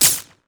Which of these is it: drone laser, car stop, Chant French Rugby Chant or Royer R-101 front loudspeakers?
drone laser